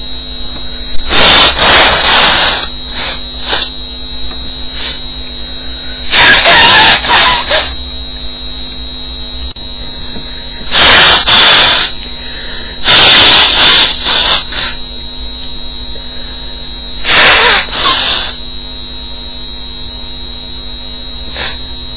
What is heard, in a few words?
blow only, female